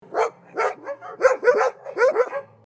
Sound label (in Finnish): koiria